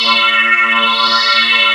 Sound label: A#4 OOW PU; Northstar; The Wizard VOL-1; SYNCLATRON